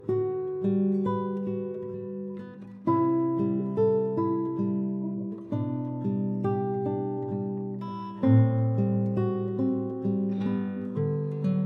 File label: Recording Room and Guitar